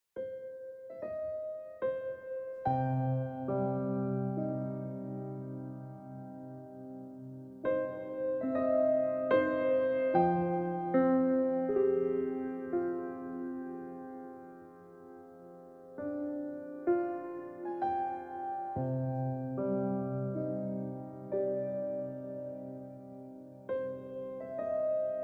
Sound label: Mystical Piano solo